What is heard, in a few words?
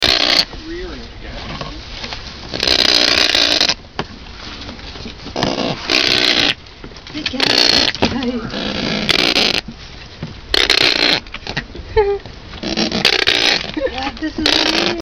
Kakapo Sound